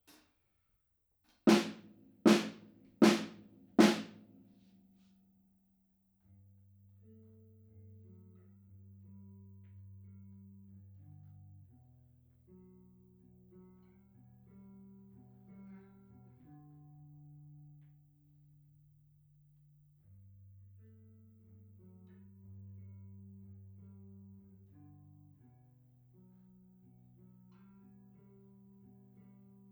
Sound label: MS Fathead Drums